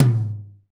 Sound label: TOM, R&B Kits, Roland; Northstar - Drumscapes Roland; Wet Kit